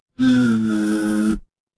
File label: feedback error